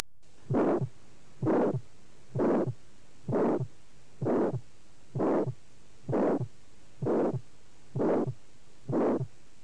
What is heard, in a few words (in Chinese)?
主动脉瓣狭窄